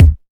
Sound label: low kick single hit d sharp key; kick drum